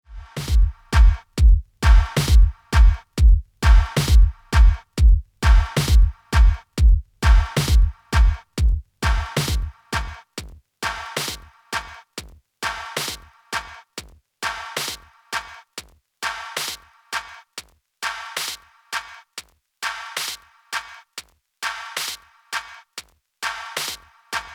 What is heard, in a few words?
Channel HPF